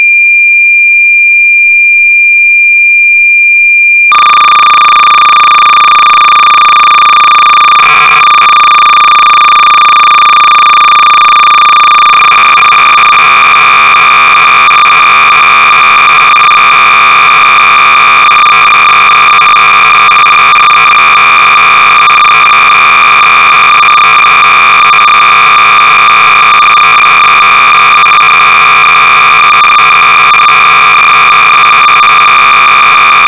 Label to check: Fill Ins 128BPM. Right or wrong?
Wrong